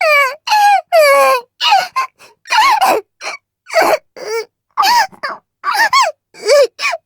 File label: loli cry; nemu